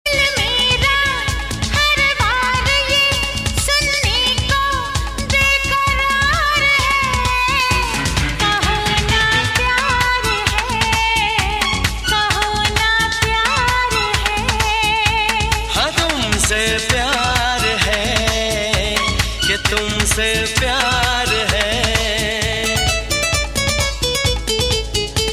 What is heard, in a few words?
romantic